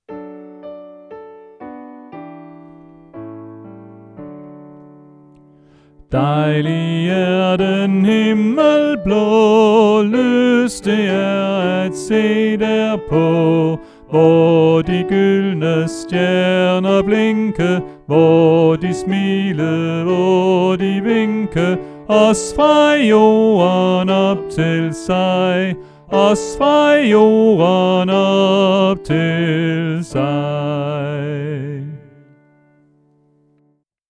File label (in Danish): Sopran; oevefiler